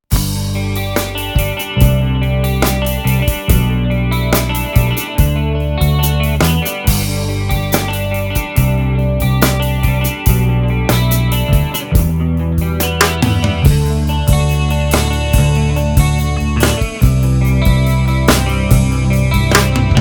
Pop, Realsamples

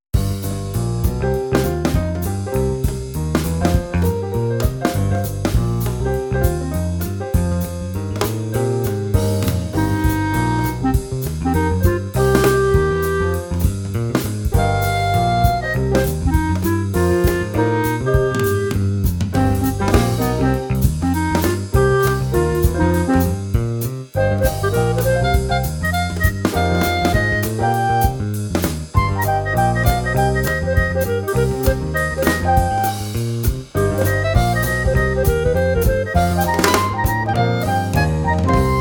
Jazzy

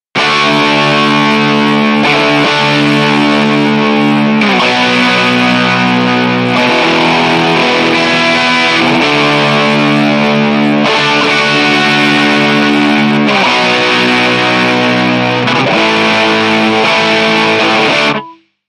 Guitar Dynatube

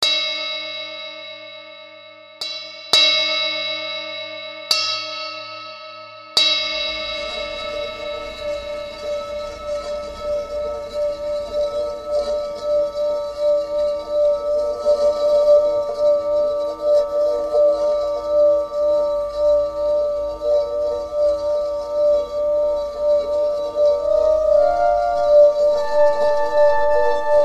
Tibetan Bell; "tone machine"

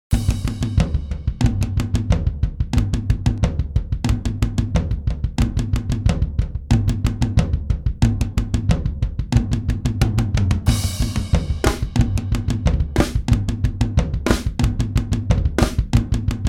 Heavy Metal Drums; completely "dry", without any mixing artifact; Realsamples

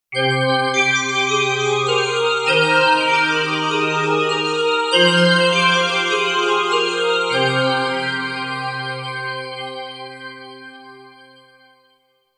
New Galaxy Pad

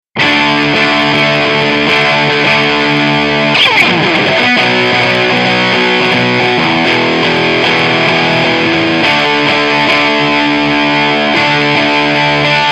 Guitar mix in fase

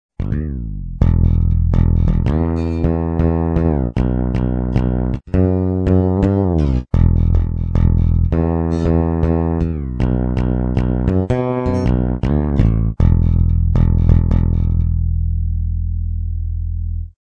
Guitar Synth Bass

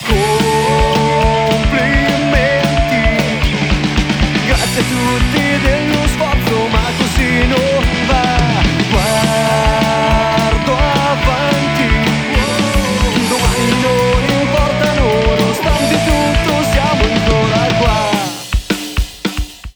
Multiband comp; Master

